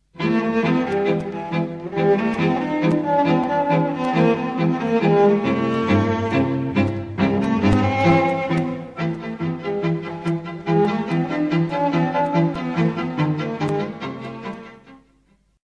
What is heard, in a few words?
Cello